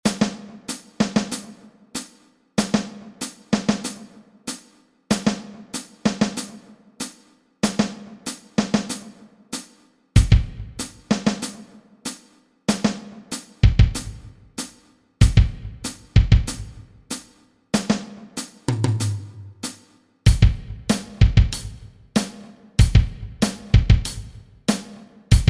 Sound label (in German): schlagzeugsolo in 7 schritten